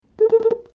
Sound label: Bulululup